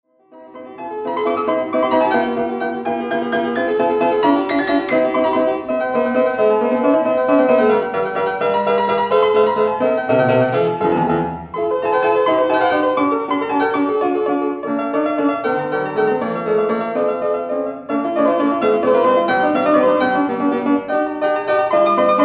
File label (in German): Schuber Sonaten